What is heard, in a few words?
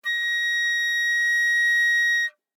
Flute nonvib ff Bb6